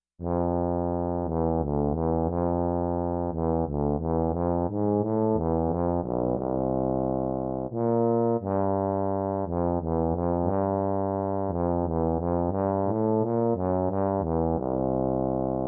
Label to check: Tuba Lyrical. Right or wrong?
Right